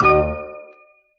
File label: Stabs